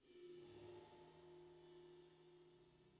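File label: 480 Reverb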